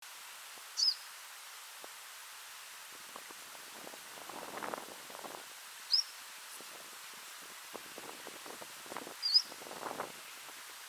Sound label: 3 variants